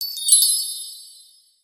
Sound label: bell tree; ambience